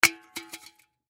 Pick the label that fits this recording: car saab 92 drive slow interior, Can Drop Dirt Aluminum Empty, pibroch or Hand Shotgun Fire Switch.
Can Drop Dirt Aluminum Empty